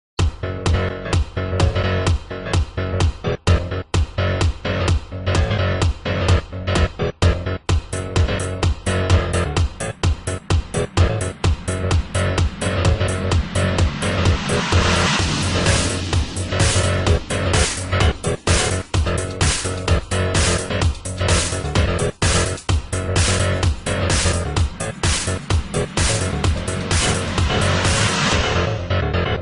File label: Wub Machine Electro